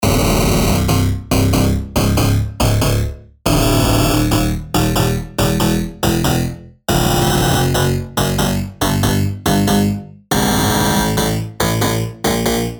Tonal Risers (140 BPM) 8bar B

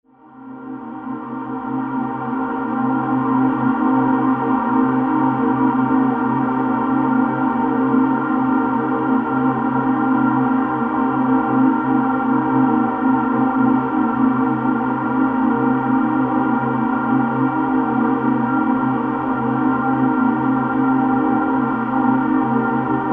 ATMOS DRONE C

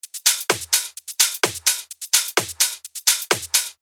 128 BPM; Withdraw

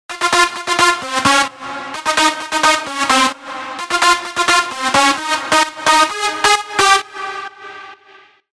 Big Room Leads WET (130 BPM); F

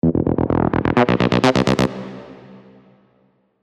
Synth Fills C (128 BPM)

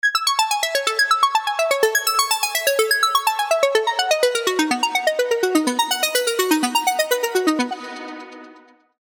Serum arps; Graduation

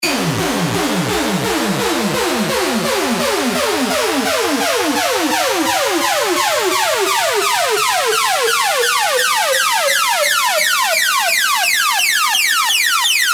Uplifter

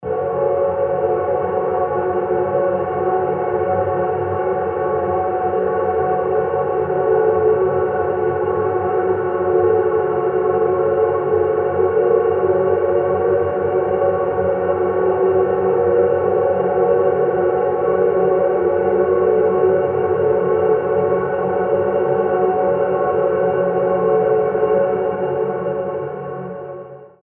ATMOS DRONE F#

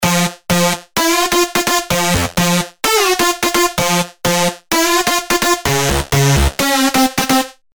Big Room Leads F DRY (128 BPM)

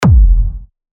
Kick F; TECHNO; Hitmonchan

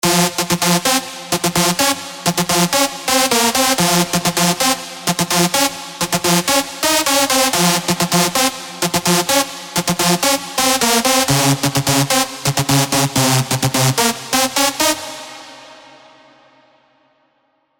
Drops Fm (128 BPM); MIDI ANTHEMS

